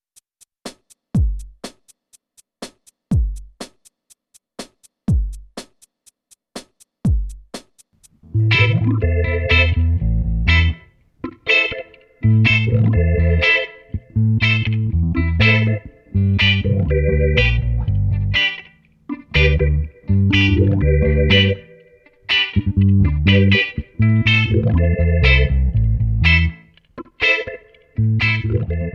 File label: straight reggae